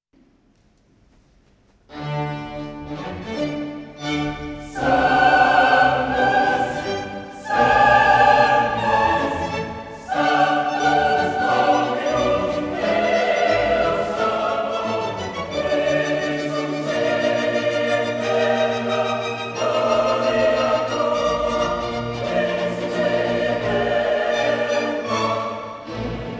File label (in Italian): Messa sol maggiore